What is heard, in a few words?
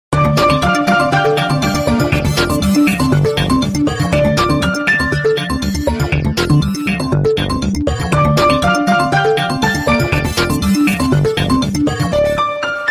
cool ringtone